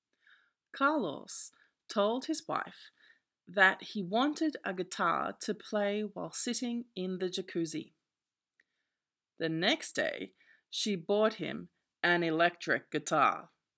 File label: Guitar